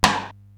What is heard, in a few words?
SDS9 RM, Simmons SDS-9, Rimshots